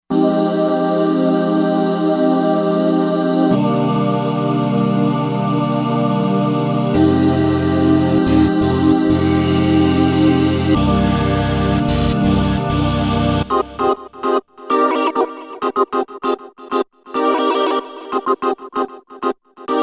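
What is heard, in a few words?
Ensemble Reso Choir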